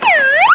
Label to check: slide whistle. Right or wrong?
Right